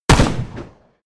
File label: revolver close shot; schusswaffen